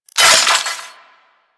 Sentient Loot Container Break; Ambience; Warframe; Sentient Devourer

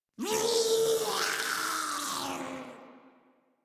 Attack Roar; en; Warframe; Grineer; Ghoul

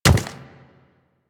Chimera Step Default; Warframe; Ballas Chimera; Steps

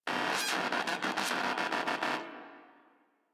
Moa Aggressive Vocal Enemy Spotted; Warframe

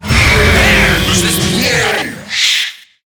Death; Hard Drive

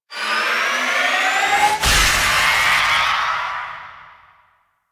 Absorb Charge; Enemies; Warframe; Infested; ZealoidPrelate

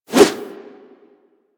Melee Idle Whoosh; Warframe

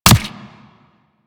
Player Bullet Impact Flesh; Warframe; Weapons

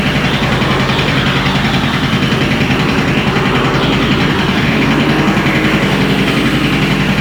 Machine Steam Loop; Ambience; Props; Warframe; Corpus Railjack

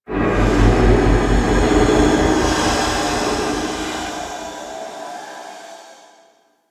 Intrusive Echo; Ambience

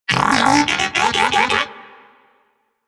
Moa Pet Enemy Spotted; Warframe; Tenacious

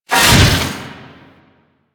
Melee Whoosh; Warframe; DE; Sentients; Erra; Weapons

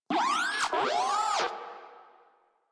Moa Compassionate Vocal Enemy Killed; Warframe; Pets

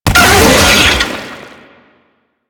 Brachiolyst Pain, Vocals; Enemies; Warframe; Proto Infested

